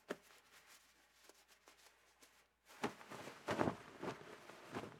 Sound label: Taking Clothes Off; Fairlight Sound Lib